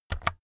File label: keyboard external key press fast